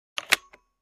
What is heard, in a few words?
Camera Shutter Hard Click